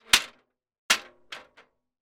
industrial metal step ladder close lean on wall bounce; zapsplat